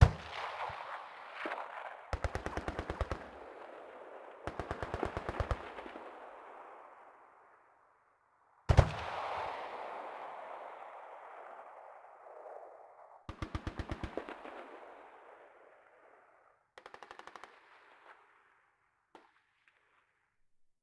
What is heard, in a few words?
automatic, combat atmosphere; World of Tanks Blitz